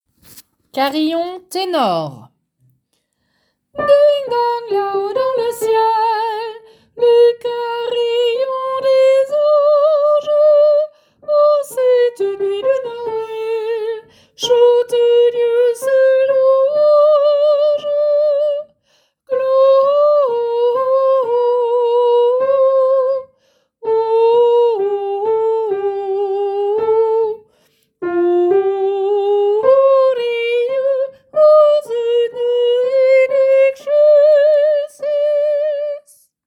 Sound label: Carillon tenors